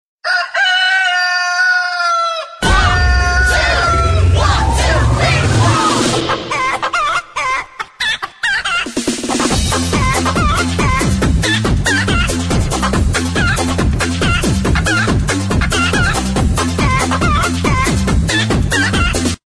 rooster

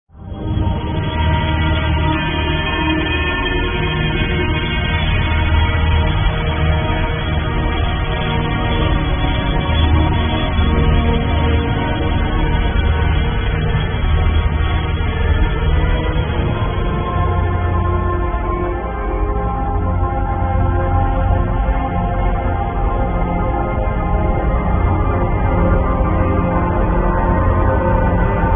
Mysterious Atmosphere

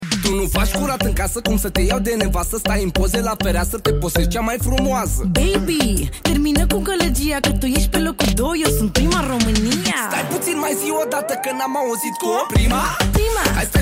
SAX

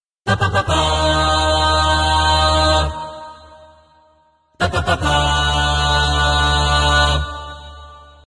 clasical

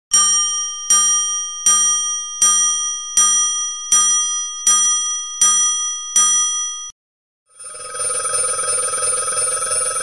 Alarm Clock

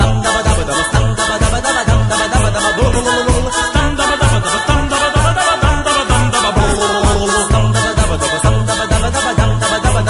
clasical

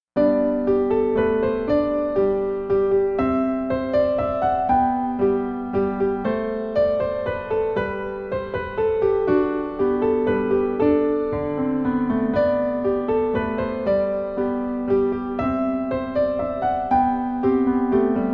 Minuet in G Major; Bach

